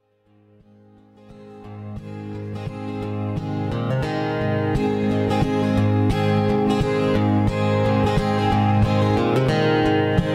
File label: acoustic guitar